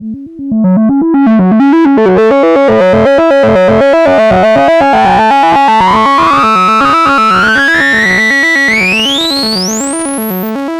24db LOW PASS Uebersteuerung; GRP Module

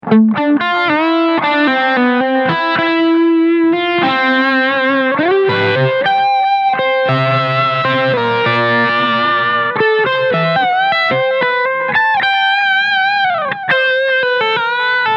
Hornet 30i Beispiel; Mooer

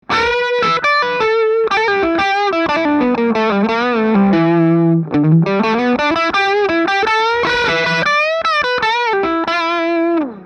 F8n Crunch Solo Git Sm57

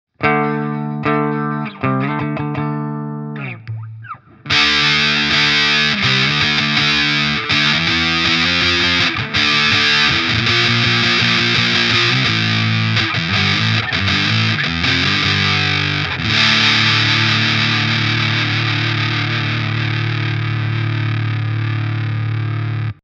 Electro Harmonix Sovtek Big Muff Pi Fuzz Sustain full erst clean tone dann midsw

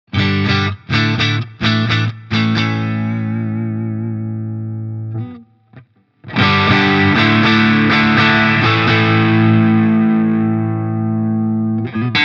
Tubecreamer leichte Zerre alle Potis auf 12h vor cleanem Amp

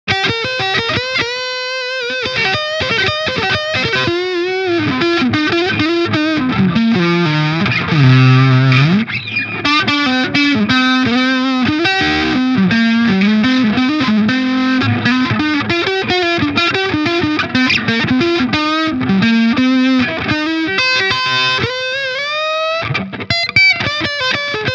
Charvel Pro Mod DK24 HH FR verzerrt Hals

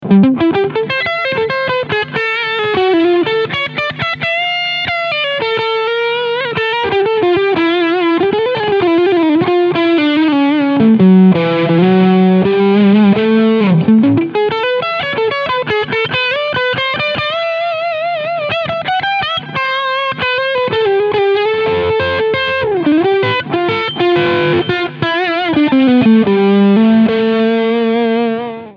Squier Strat Leadsound Steg Pickup; 40th Anniv

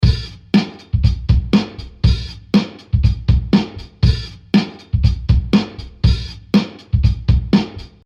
Drumloop nur Amp

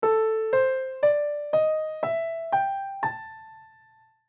Pentatonik

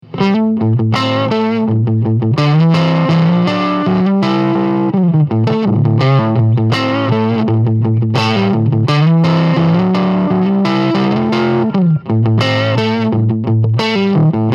Laney Cub Super8 Volume Full Tone 12 Uhr

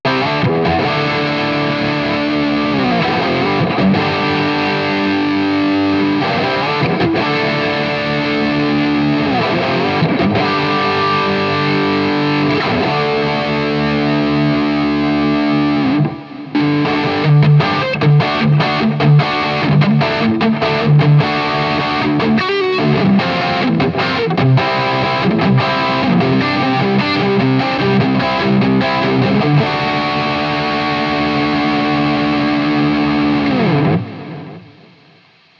Floorboards im Vergleich Highgain w Pedal OD; Headrush Core Prime

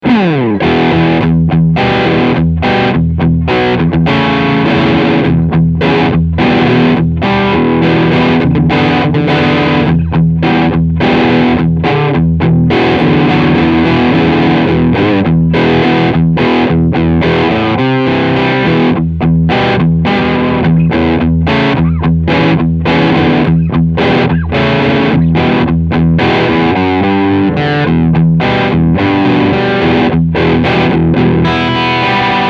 Steg PU Crunch

